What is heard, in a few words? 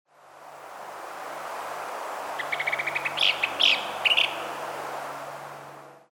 Kingbird Loggerhead call, Abaco Island Bahamas